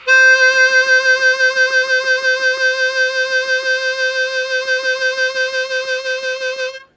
Strong Vib Sustain C2, Harmonica; Zero G

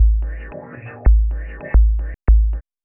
Sub, Ethereal Textures Otherwordly Rhythms; Sonic Foundry (Sony Creative Software); Ma Ja Le

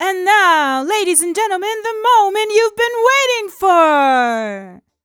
f mel d, fem Vocals

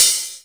OPEN HI

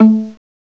PIZZ LOW; CMI Sound Library Rev 1.3

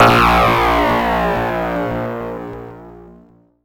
A#, Synclav FM; Big Fish Audio; SPACE1

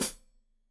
Hat Foot, Hi-Hat, Cymbals Stereo

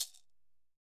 PERCUSSION, Roland MV8800; MVPERCUSSION

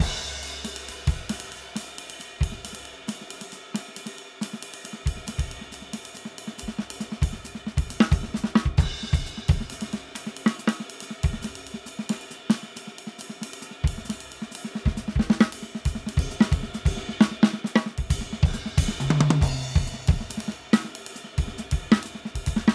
Straight 8th Jazz Improv 136 bpm, drums; Basement drums vol 1

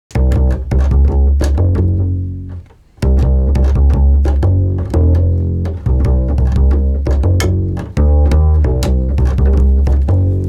breaks, Jungle; Zero-G Jungle Frenzy 2

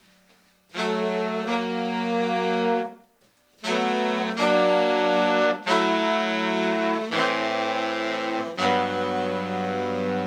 Soul Horns, 70s Analog Funk; Sonic Foundry (Sony Creative Software); What it Is!

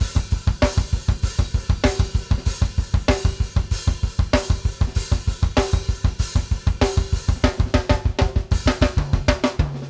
BASIC B, Drumworks; Sampleheads; New York City Drumworks VOL-1

